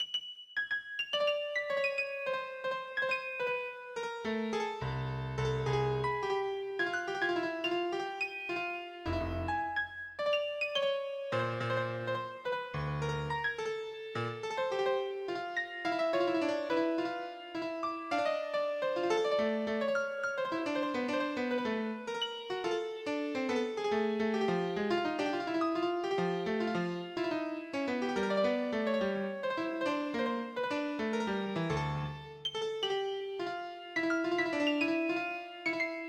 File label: Habanera piano